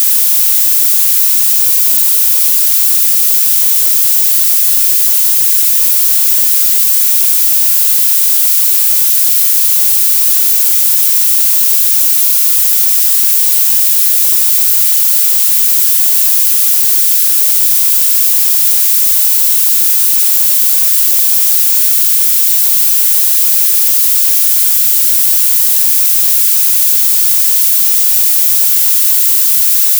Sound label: Purple Noise